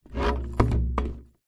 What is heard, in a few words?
musical drum tom set down